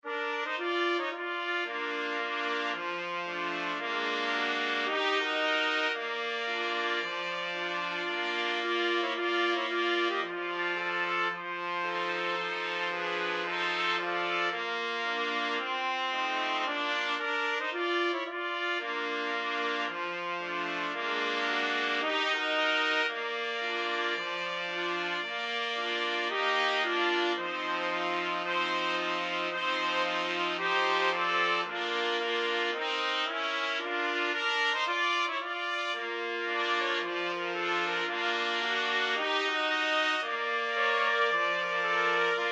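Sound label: 4 trumpets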